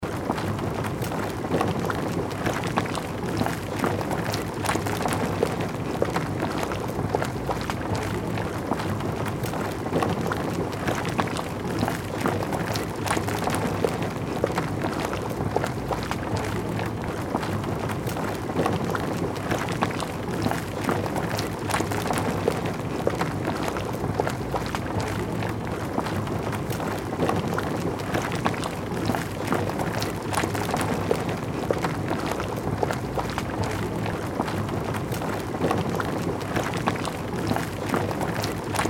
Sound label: boiling water